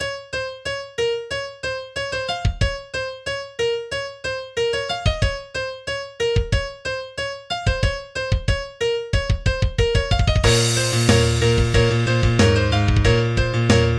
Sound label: Dubstep Piano